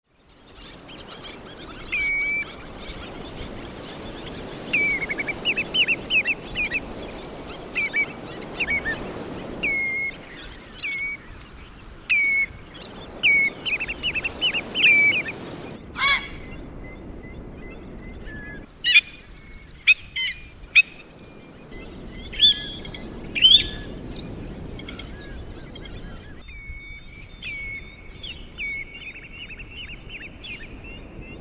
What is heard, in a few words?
Birds in the lagoons